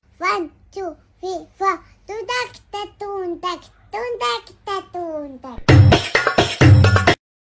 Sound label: dum tek sedaye bache